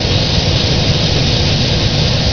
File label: air exchange area, ambient; hl2